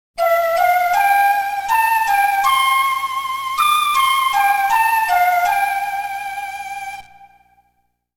The Poké Flute

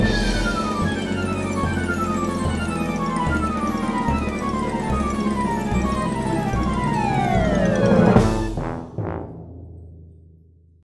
Intro Jingle Music; Doodle Jump Space Chase

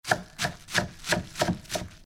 Chopping; pucca n friends ios

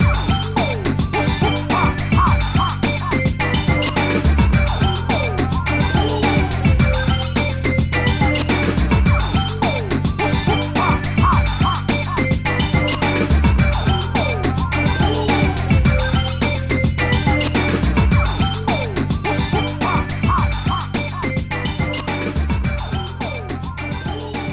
Jingle Camera

